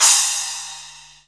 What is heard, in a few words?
CYM, CYMBALS; USB Soundscan vol.20 - Fresh Disco House I